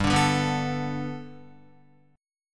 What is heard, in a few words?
G Major Add 9th, Gadd9 chord, Guitar, 4F,4A,5C,5G,5C,6E; Gadd9 strummed